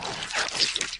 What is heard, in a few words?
infector idle; flood